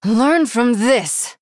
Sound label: Female Human Attack